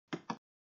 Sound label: click